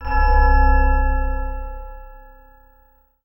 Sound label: Bell Hit; SFX & One-Shots